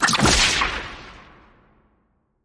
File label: Magic Relic Strike